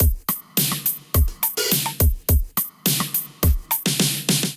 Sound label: Kit 105 bpm, Drums; MIDI Clips; Harbour Kit; Drive and Glow; Ableton; Factory Packs